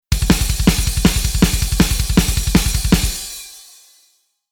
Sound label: Rhythm Metal